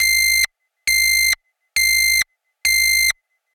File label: Beeps, Buzz, Coarse, Tight, Muted, Very High, Loop - faint background beeps; Beeps and Buzzers